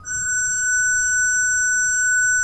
SWL F5, Cathedral Organ; ILIO; Propeller Islands

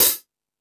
Metal Drums, E-MU Pro-Cussion

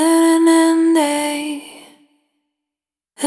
Vapor, Single Voice Melodic Loops

